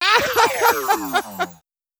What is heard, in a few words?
funny; Rosegarden; russia